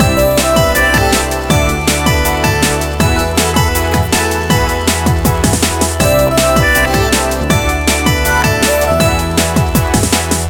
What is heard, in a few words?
music; wbg games stable; progressive jackpot diamonds